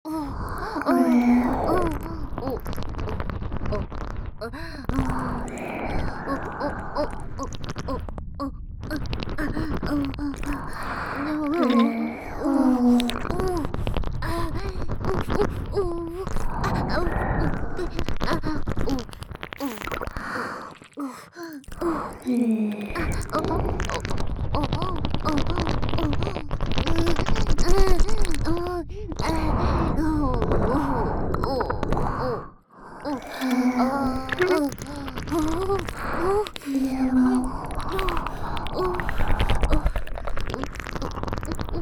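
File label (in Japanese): オホ喘ぎ SE無＋ぐぽぐぽシンクロ耳舐め; 3WAYループ; おまけループ（オナサポ）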